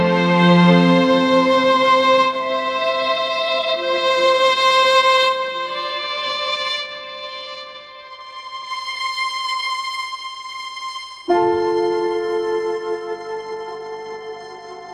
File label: orchestral strings, ringtones